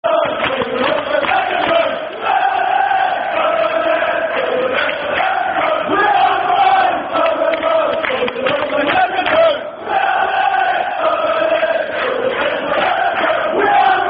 We Are The Right Side, We Are The Left Side London Road [Football Chant (Soccer Song)]; Oxford Unit